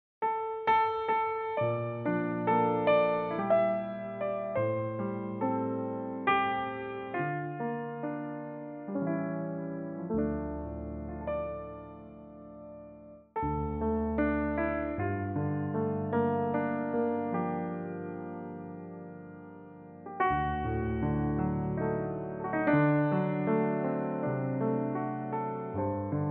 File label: piano, Dm